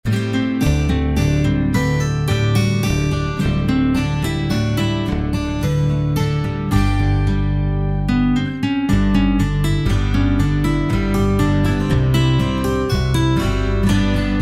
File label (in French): HAWAI GUITARE